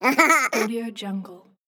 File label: cartoon silly laugh